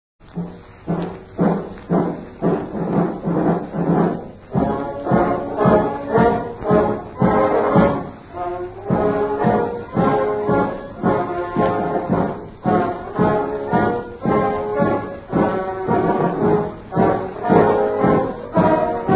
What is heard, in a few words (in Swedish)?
marsch; Finspongspojkarnas; sweden